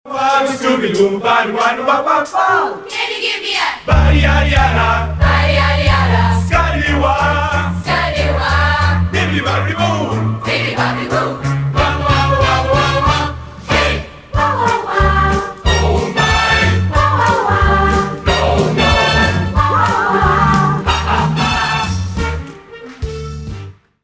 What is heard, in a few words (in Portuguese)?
Coro Mozart